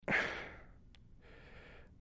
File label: Breath Sigh Light; breathing